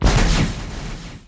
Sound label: MISSLE; Guns; MicromanÃa 35